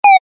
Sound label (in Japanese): ピウ レトロ